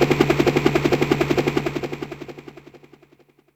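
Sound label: Helicopter; Yamaha DTXpress; Effect 1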